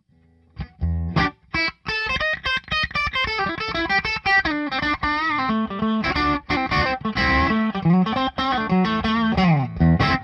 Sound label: Bridge Pup